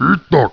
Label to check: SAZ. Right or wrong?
Wrong